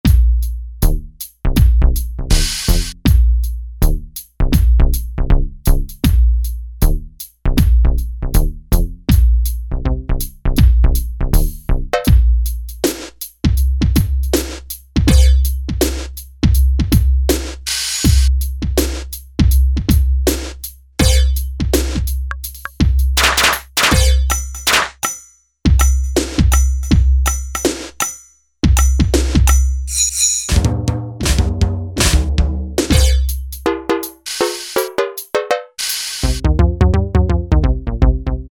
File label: Rap Session, E-mu Pro-Cussion; Factory ROM kits